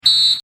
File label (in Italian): fischio; stadium